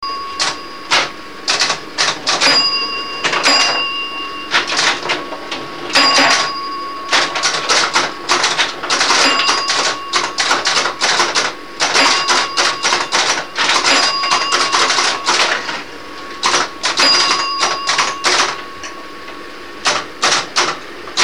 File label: fireball